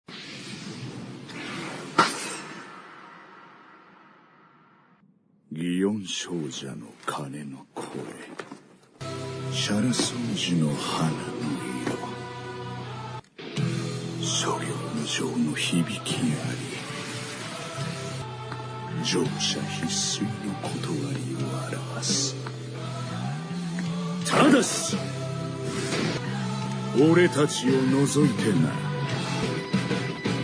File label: epic entrance